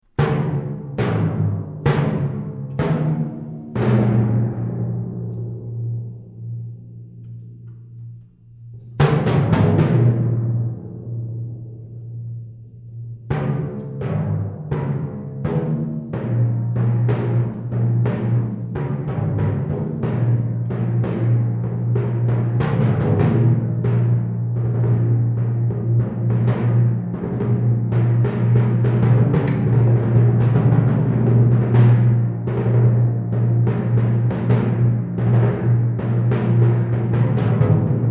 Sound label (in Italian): percussioni